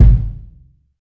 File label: huge kick single shot f sharp key, Royality free bass drum sound tuned to the F# note; kick drum samples